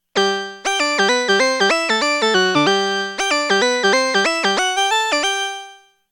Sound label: lead voyager; moog voyager; keyboards